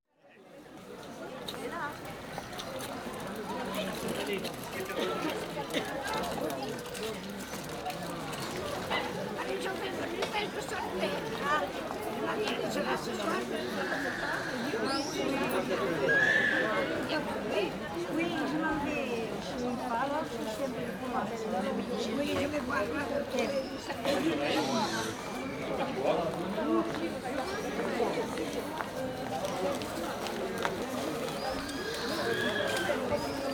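Market France, exterior atmosphere ambience with people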